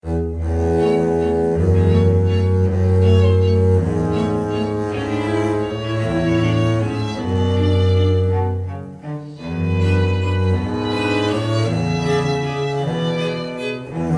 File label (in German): Klingelton Kontrabass; Android